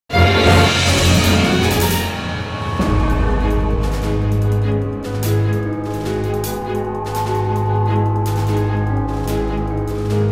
Fight Start; music; Silas; The Da Vinci Code; XBOX

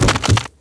deadfall metal, foley

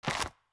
FS Dirt, Footsteps

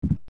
step, footsteps

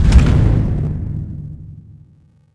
bridge end; Star Wars Obi-Wan; XBOX

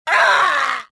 choke

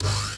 servo, droids robots

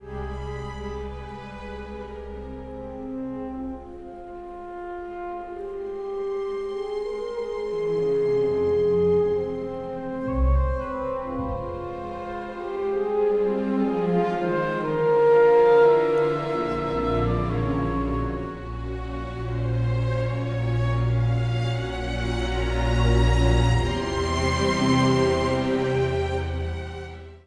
music flourish

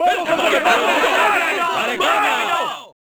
burst, Crowd

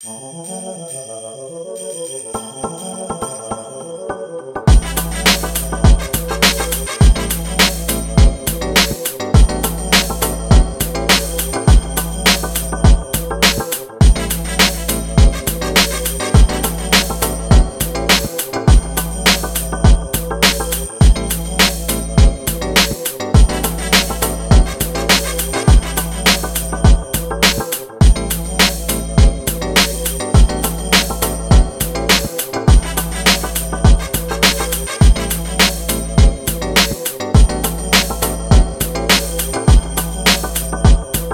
Instrumental, Rap-Hip Hop

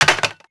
coke can falls; Max Payne

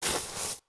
FS Snow, footsteps

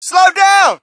crowd, shout; Mike Tyson Heavyweight Boxing

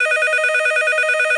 phone; Virtual Pool Tournament Edition

